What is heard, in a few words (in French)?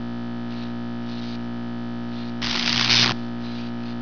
etincelle